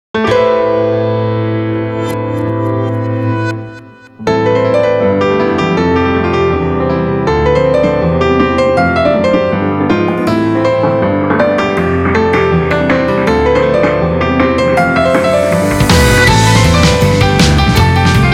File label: Piano